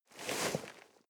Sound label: nylon leather soft short